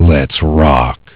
YELL

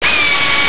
FUN GUN

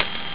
CLICK

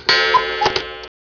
CUCKOO

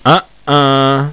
uh uh

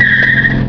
car

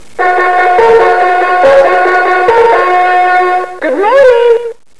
REVEILLE

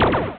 Gun

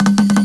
TOM TOMS

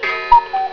CUCKOO

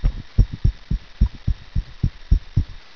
ALARM; CLOCK

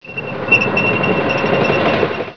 TANK MOVE

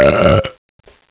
BELCH

